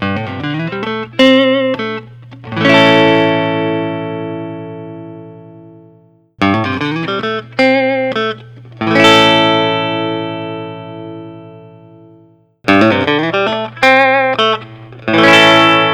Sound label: blackguard t vintage blonde; gil yaron